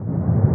One Shot, Hits

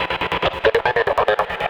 techno vocals 140

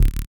Glitch, One Shots, Glitch FX

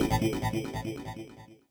TECHNO FX 140

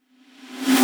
Future Bass Snare Reverse

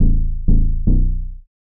HOUSE BASS 125 A

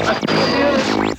RADIO; JUST FOR FX

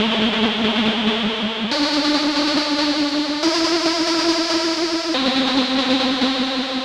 TECHNO SYNTH 140; Keys

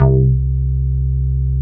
BASS MONO

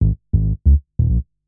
TECHNO BASS 140 A

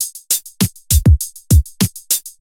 Rollin 100bpm

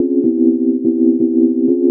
house synth 125 A, keys

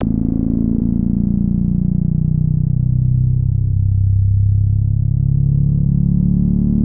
SNTH BASS TEKNO 140 A

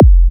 Minimal Kick, Drums, Kicks

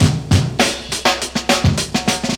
BREAKBEAT 102, loop, BPM; 100-114 BPM

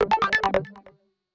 FX SHOT TEKNO 140; Techno2; Singleshots

